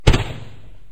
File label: boom, fireworks